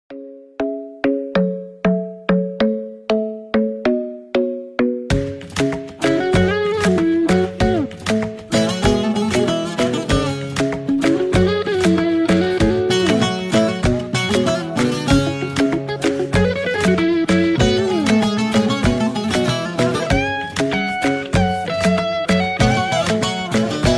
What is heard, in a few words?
Remix Ringtone